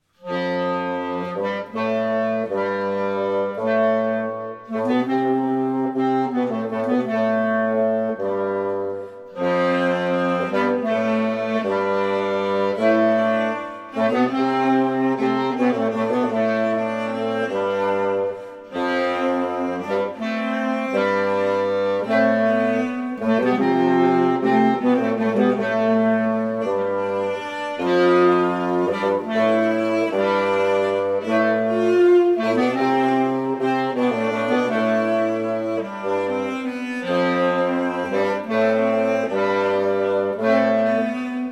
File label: instrumental; gloin; la canción de gloin